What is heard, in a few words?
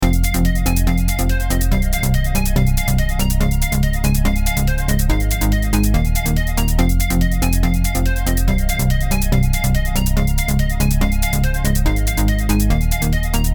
Synth Layer Mono Toggle